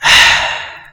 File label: Chinese Cymbal